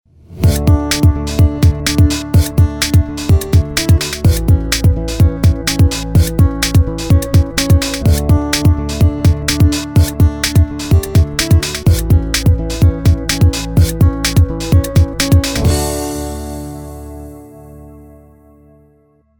Driving Home Smiling

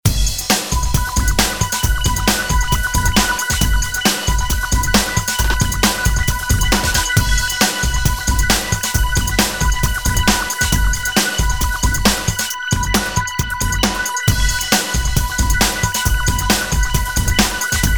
Bubbles Alive

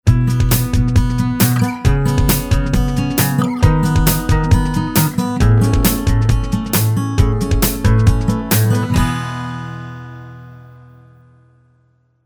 bed short and happy

